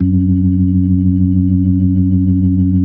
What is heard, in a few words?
F#2F, B3, Vintage Keyboards